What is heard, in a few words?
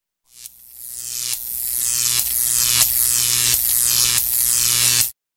Electrostatic Effect